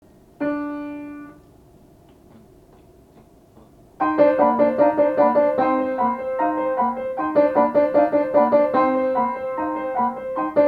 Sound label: melody